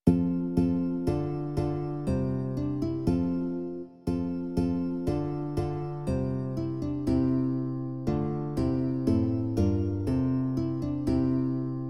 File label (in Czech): 2 kytary; Ó náš milý Bože; Imaginativní verze